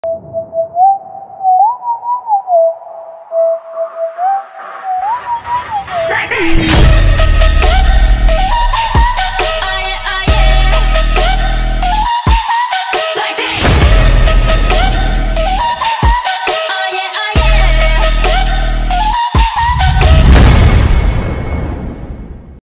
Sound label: BGM korean ringtone